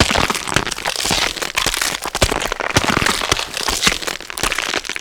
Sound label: powerup pickup plague infected loop; tf2classic